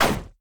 general death; Crypt of the NecroDancer

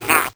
mimic chase; Crypt of the NecroDancer

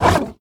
blues piece attack; Crypt of the NecroDancer

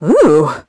confused; Crypt of the NecroDancer

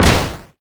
crate break; Crypt of the NecroDancer

